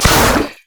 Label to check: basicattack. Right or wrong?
Right